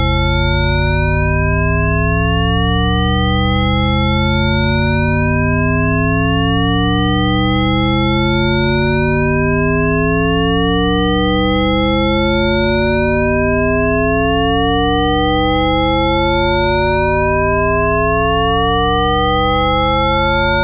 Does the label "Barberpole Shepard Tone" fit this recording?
Yes